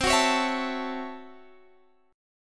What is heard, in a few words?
A 6th C, Chord; A6/C strummed